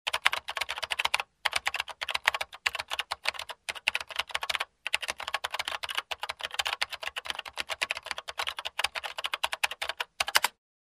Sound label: Typing on Keyboard